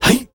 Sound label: HIEE, World Voices, Afro noise; ILIO; Vocal Planet VOL-5